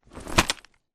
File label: arc tool steal; Beacon; Titanfall 2